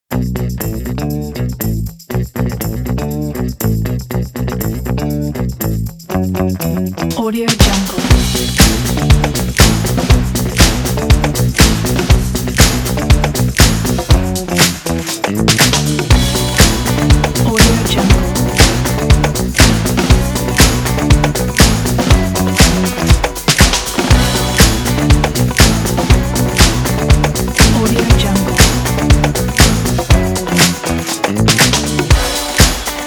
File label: funk kit